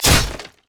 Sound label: basic attack sword; rengar